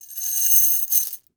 Horror Scary Chain Metal Chains Dragging On Cement